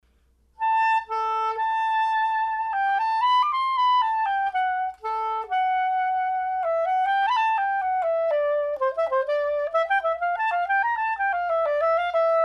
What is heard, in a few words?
straight soprano